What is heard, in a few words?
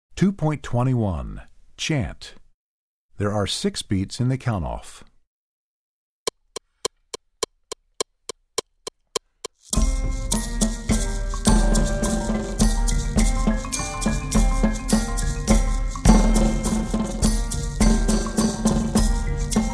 Chant, Percussion; Percussion Book 1